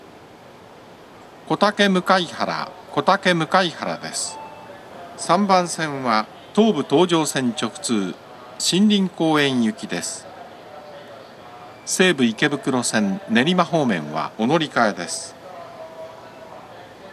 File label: shinrin koen, tokyo metro station; kotake-mukaihara